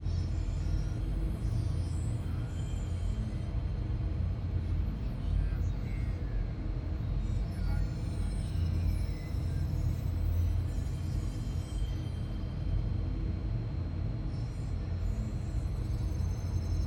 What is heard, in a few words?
Ambience Sparks Supplies